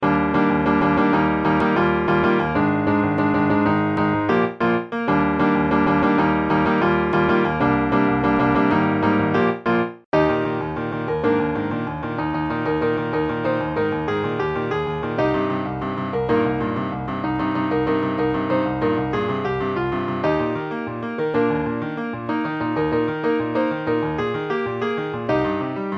piano

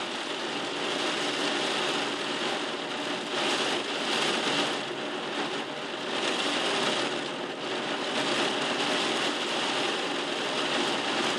the sound of the rain outside

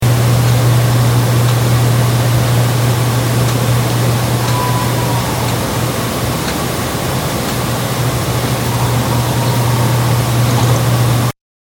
owls

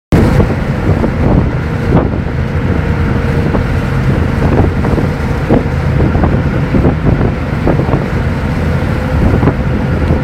crashing waves

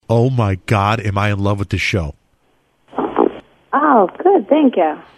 farts

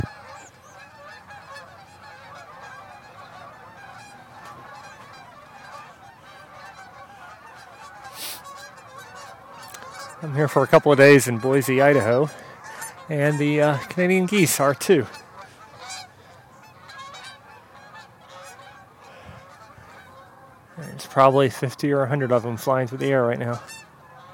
canadian geese boise idaho

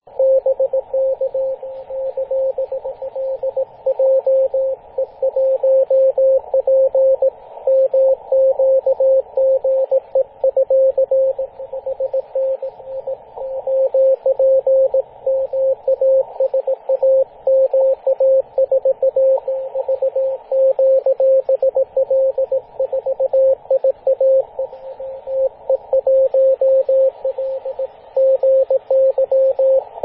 14.013 MHz CW; h44lg

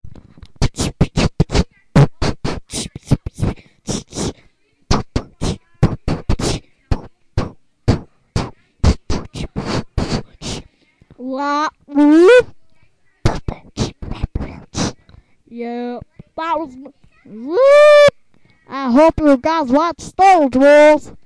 rap

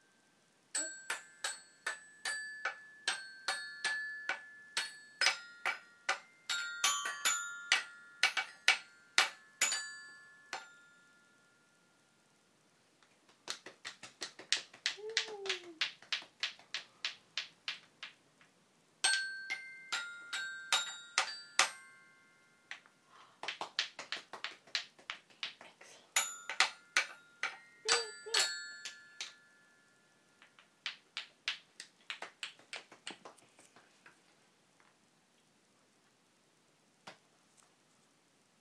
glockenspiel; symphony in j minor

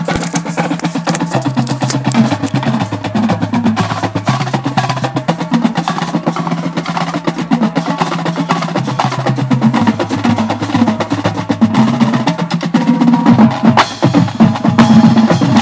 drums at the mall

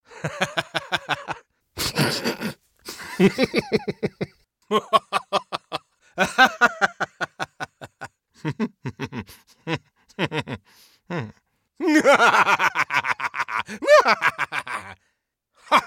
random laughs; audition

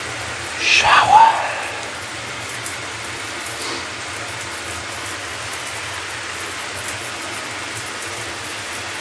shower

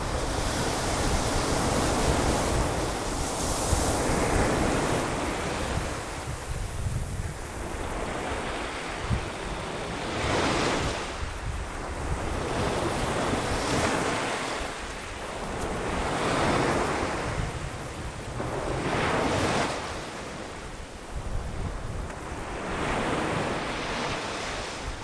sounds of the sea

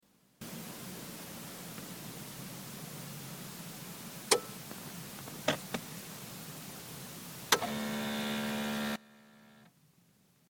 citroen click click whirring

